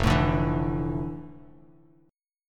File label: F Major 13th Bass Standard; strummed; JGuitar